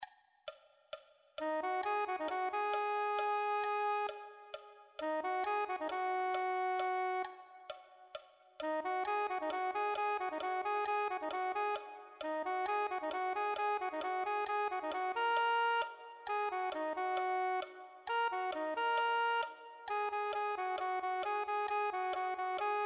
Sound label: soprano saxophone